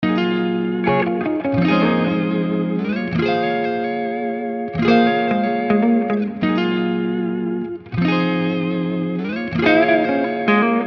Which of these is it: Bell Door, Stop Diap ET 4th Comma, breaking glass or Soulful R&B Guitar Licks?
Soulful R&B Guitar Licks